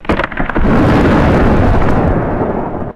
massive glacier ice cracking and echoing

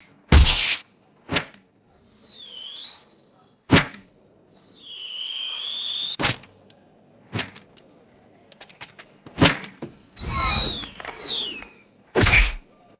arrows whistling by and striking a surface; weapons

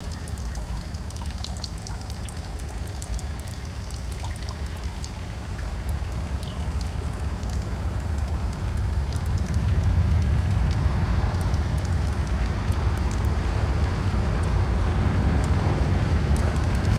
continuous rain dripping and distant rumble

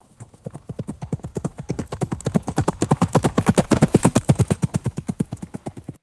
horse trotting on grass passing by; footsteps

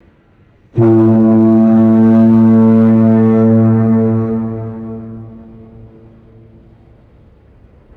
loud deep foghorn blast with echo; horns

